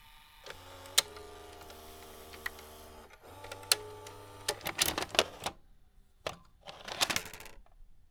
Brodcast VTR Tape Eject; SFX; IRL Recorded

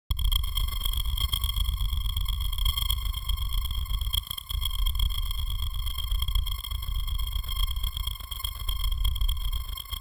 Bitcrushed, Ambient; SFX; Ambient - General

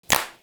Clap, snares - claps

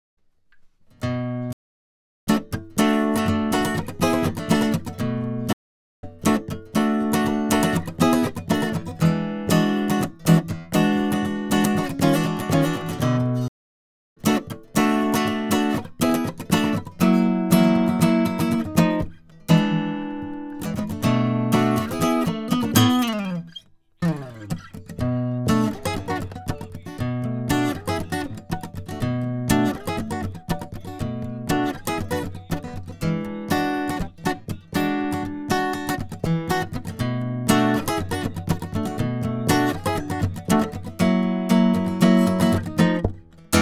Guitar NT1A; Reaper project

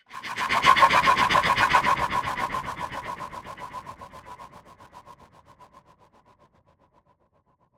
Sweeper; SFX; Ambient - General

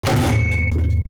Table Hit; SFX

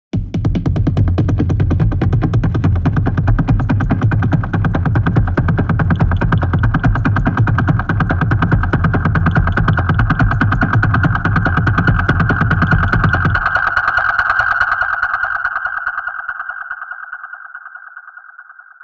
Water Bottle Drums 143 BPM, loops

